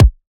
Kick, dubstep

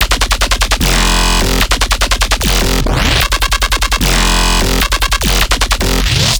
Bass Shot F 150, Dubstep; Dubstep Basses; Loops; GhostHack; Advent Calendar 2021

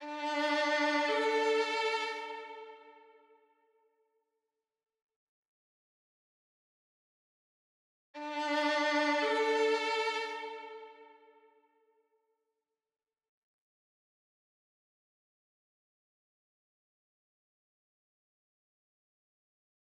118 BPM D Min Violin; Melodies; Cymatics; 2022 Melody Mystery Expansion; Sacrifice

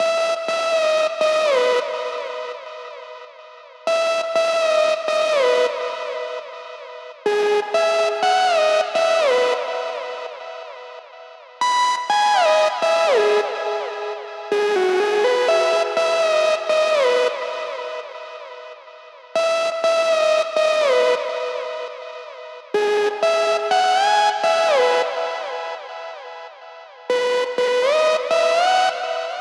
124 BPM C# Min Lead, Euphoria; Melodies; Loop Stems; Cymatics; Cymatics - GHOST (Beta Pack); Come Back

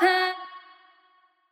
Wet One Shot - F#, Vocal; Tonal One Shots; Cymatics; Euphoria Vocal Sample Pack

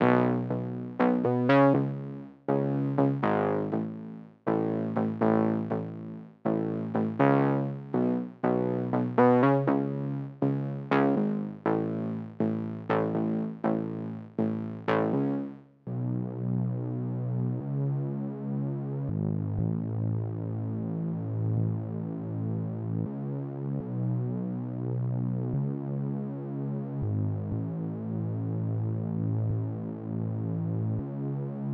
121 BPM C# Min Bass Synth, Pop; Melodies; Loop Stems; Arena

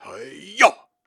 Grunts and Chants, Viking Vocal

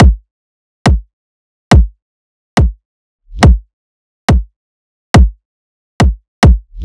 Riddim Drum Loop 140 Kick

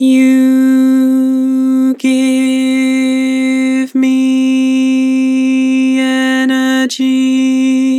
Vibrato, Vocals; Give me energy; Harmor Vocals

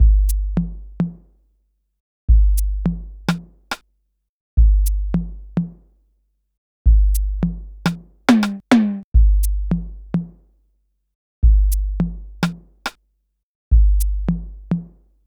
Percussion Loop - 105 BPM; Dancer; Cymatics; ANALOG EVOLUTION Beta

